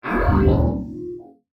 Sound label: reset board